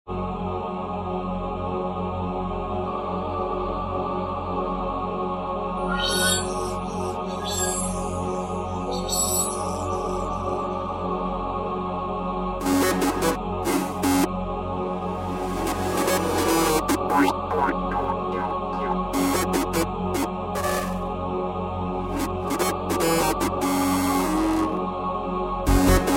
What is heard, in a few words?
Gravity Waves GOA Trance